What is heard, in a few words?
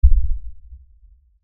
LFE Ultra LFE Hit, Lfe Ultra Low Bass Hit Impact; Deep Tone